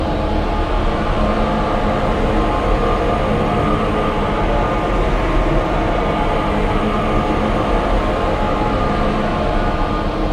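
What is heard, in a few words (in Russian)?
циклический гудящий окружающий звук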